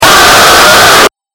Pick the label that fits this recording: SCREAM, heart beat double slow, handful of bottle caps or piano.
SCREAM